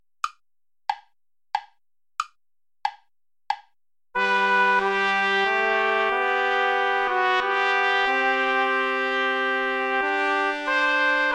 sarabande play along without trumpet

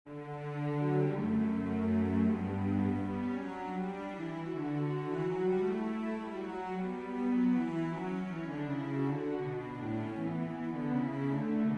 for winds strings; Charpentier Marc-Antoine; plus grand des ros

